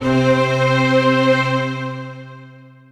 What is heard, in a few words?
Big strings C3